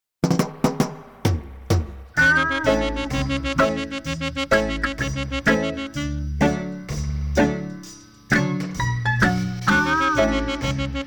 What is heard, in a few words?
Dub